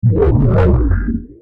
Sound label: time stop; FXHome